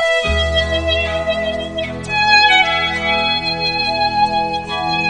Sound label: Amazing Grace flute violin